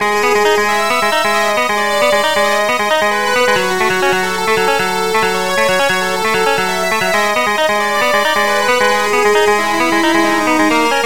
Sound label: Boss Music